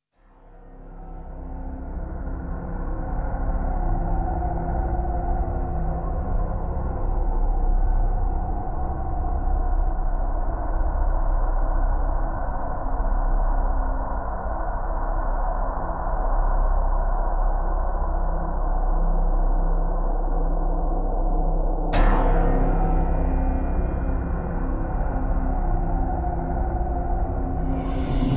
Our Time Has Come (Drones Only)

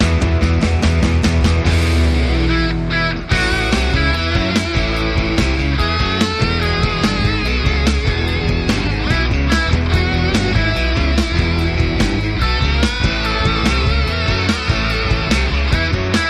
Rock Happy Birthday

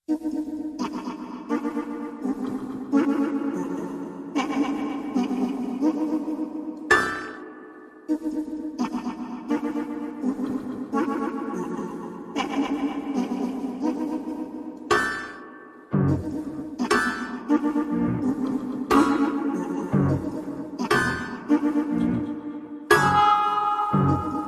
No Percussion

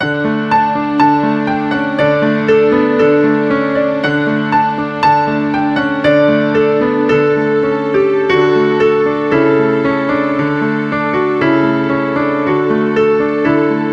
Piano Violin Cello

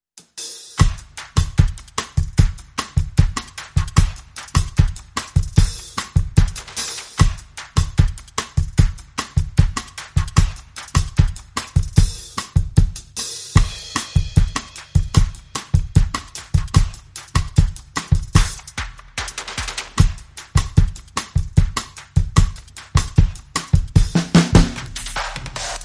Get Moving Bossa, Drums and Perc Only

